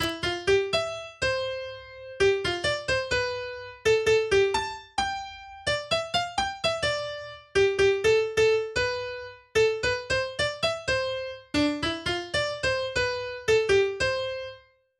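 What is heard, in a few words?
Bossanova, MIDI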